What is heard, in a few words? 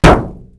car; Mafie 1